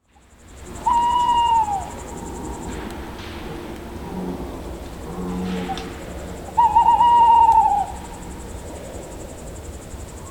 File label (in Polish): puszczyk; Odra; Odra Sound Pack; Usłysz Odrę. Historie w rzece ukryte